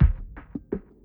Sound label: Kick Crunch; Drums; BB 2HTC Samples Vol 4